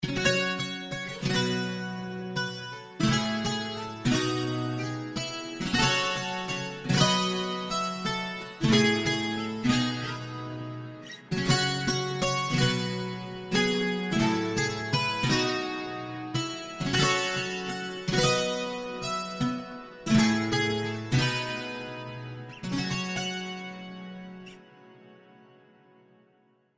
Smooth Guitar

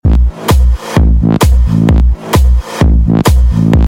Bass Sms

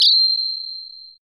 Ziiiiing

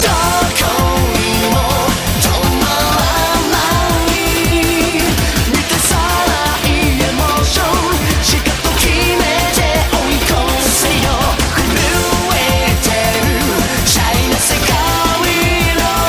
Rage Beat

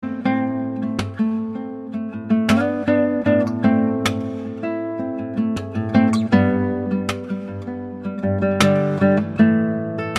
acoustic

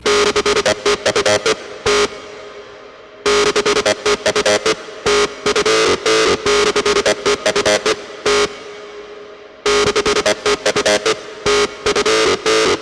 Loud Hardstyle

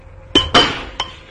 Kick Tree Bonk

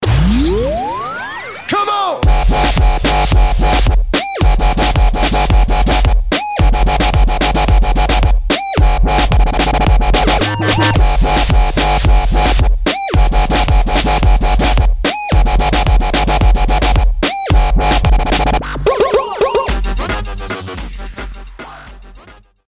Hard DubStep

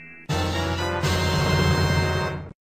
Item Found

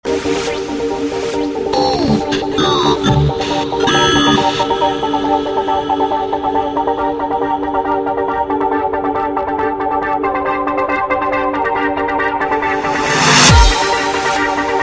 Message Tone

